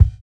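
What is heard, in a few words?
kick, Roland MV8800 drum kit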